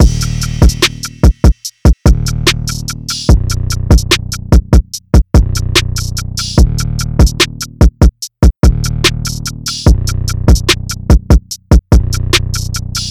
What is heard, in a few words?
146 BPM Ebm